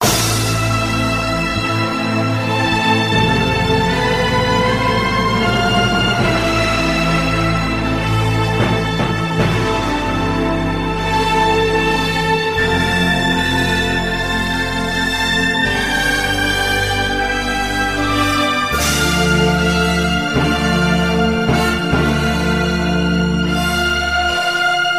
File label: strings tragic madness, Sad Loops